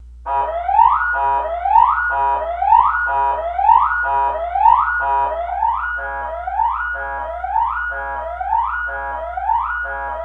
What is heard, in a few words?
Horn Siren Horn Siren